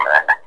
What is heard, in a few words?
ANIML; Zero G; Man Machine